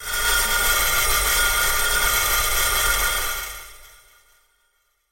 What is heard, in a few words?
horror ghosts scream; soundscrate